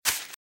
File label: Velociraptor - Footstep - Grass - Run - Left